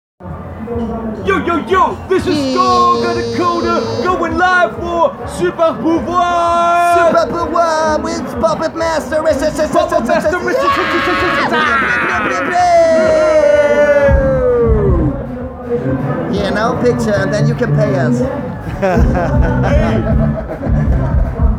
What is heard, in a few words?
Vocal